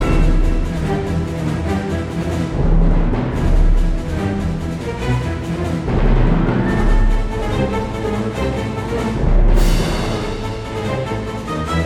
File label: Combat; Church Combat 2